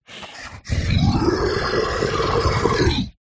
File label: Big Monster; PMSFX